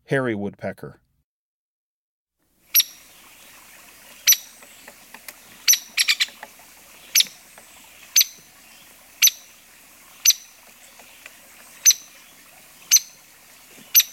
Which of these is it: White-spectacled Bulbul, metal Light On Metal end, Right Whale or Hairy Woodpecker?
Hairy Woodpecker